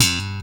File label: POP HMR F3, slap bass